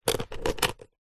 foley lock small diary movement could be unlocking; zapsplat